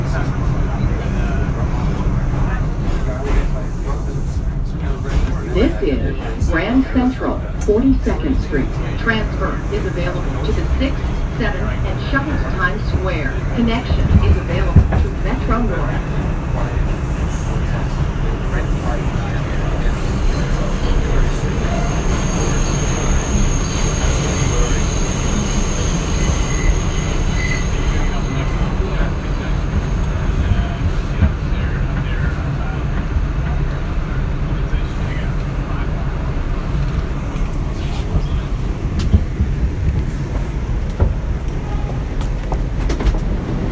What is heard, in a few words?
nyc subway music